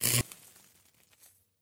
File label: stunstick impact; weapons